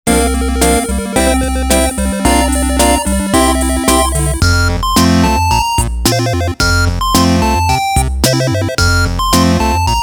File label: Curtain Call